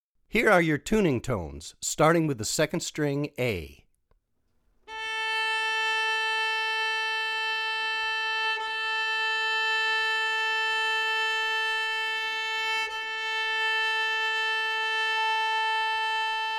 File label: Tuning Tones, Swing Jazz Violin; Swing Jazz Violin with Hot-Club Rhythm